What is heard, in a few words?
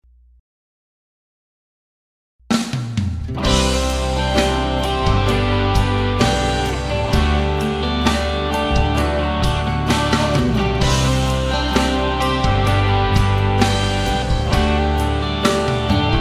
Male Vocal, music